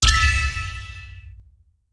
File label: Reward Gain